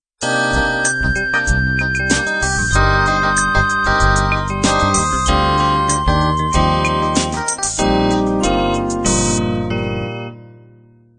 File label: 16beat soul intro